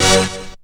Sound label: HIT, STAB; SoundFactory; SMPMANIA